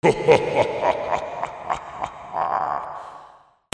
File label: CARTOON